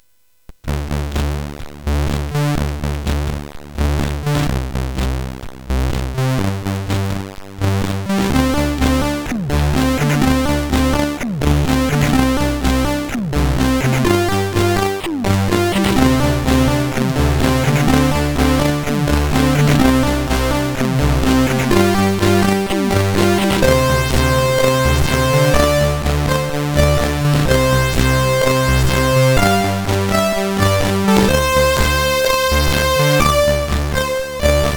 Rock, CSG8580R5; hvsc

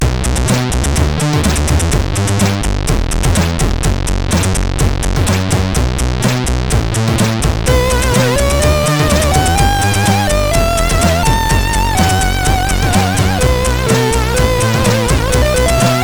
sid CSG8580

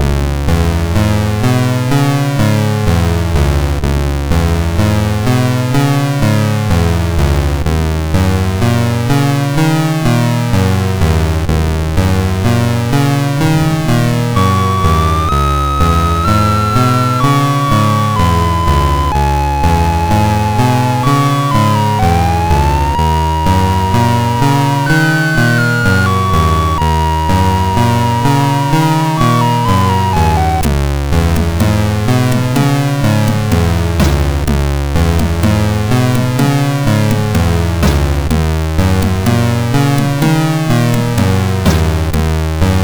game over, sid MOS6581R4

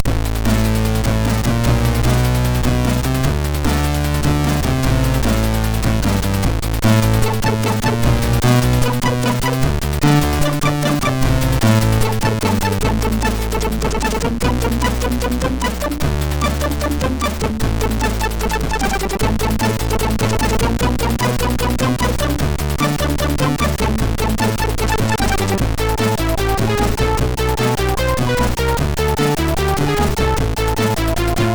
tune, MOS6581R4; Tripler tune 2

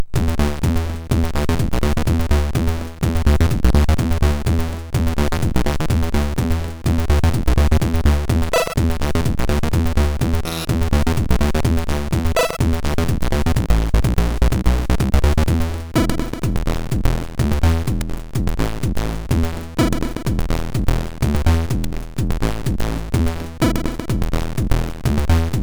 Vroom Vroom, MOS 6581

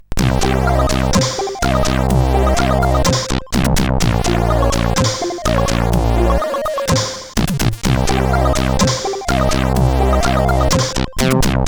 Pixel Sinus, sid CSG8580R5; Fly Silence; Five Times Pixel Sinus

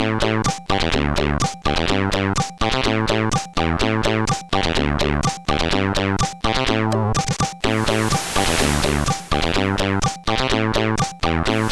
CSG 8580 R5; Cowboy Kid

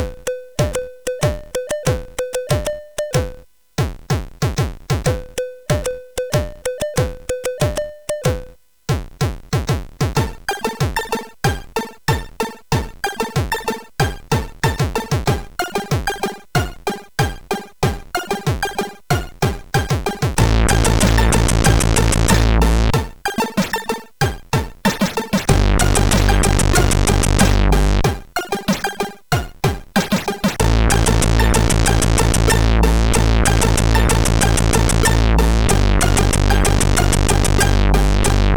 Ping Crap, CSG8580R5

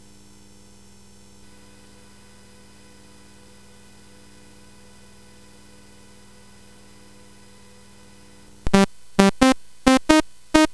BASIC sid CSG8580R5, GAMES; Strip Poker

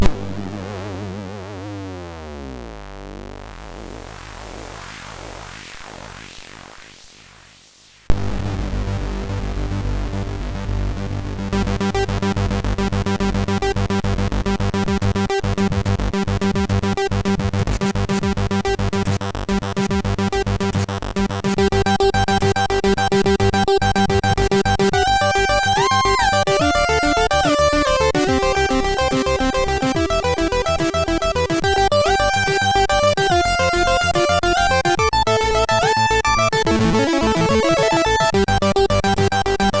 Minimalistic Tune sid MOS6581R2

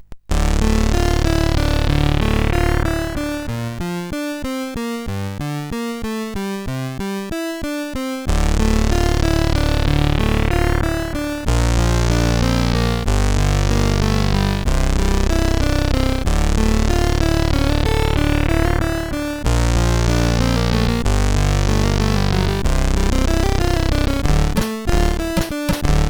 CSG 8580 R5; sid

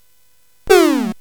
Dark Castle, CSG8580R5

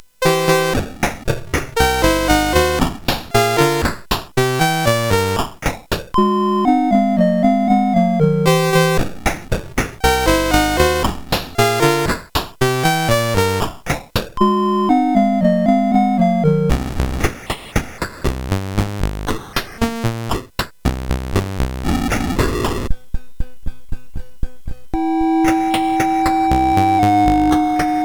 Slam Dunk, sid CSG8580R5; Jim Cuomo

